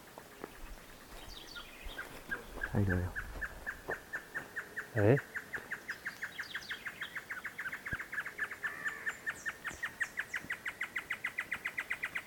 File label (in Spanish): Chororo; Taraba major